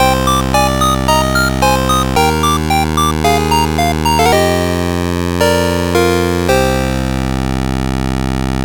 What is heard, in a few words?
gameover